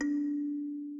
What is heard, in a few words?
Kalimba; Puddle Plus